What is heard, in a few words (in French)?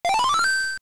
son clef obtenu; Zelda; programmation labyrinthe